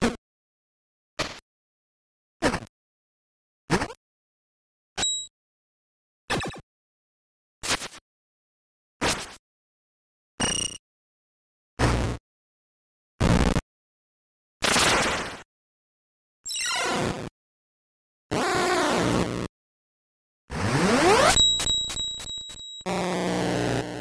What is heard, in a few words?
dragon unit sound effects